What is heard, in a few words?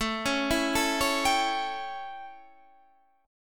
A 7th, chord; arpeggiated